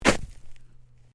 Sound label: FOOTSTEPS SNEAKERS SHALLOW SNOW JUMP STEREO; Walking in sneakers or runners in light snow